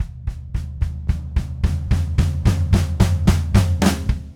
Red Maple Groove 110, DW Red Maple Kit

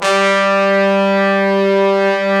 BRS LO BRS, Fat Pop Sect; Roland L-CDX-03 Disk 2; Fat Section

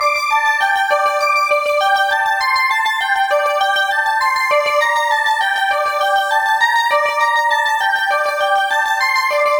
100 D Seq Melodic; Zero-G; Future Wave

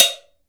cowbell; AKAI S6000 CD-ROM - Volume 5

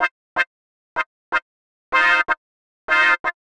125 Raw stab F, Keys, Nu Jazz House; PowerFX - Nu Jazz House

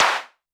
snare, Drum Machines, Clavia Nord-Drum; 300 Drum Machines

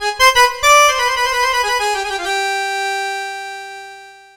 SYNTH, ARABIC

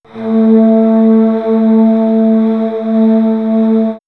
Bamboo Flute; X-Static Goldmine; backtothe70's

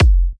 Kicks F; markus hakala

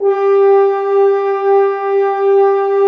BRS F HRN, FRENCH HORNS; Roland - LA Composer vol. 1

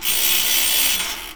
AWAKE, household; AKAI Anime Sound FX

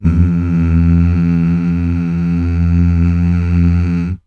MMHH

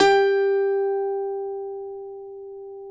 cuatro; Spectrasonics; Hans Zimmer Guitars VOL-2

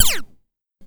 Electron Sidstation, Drum Machines

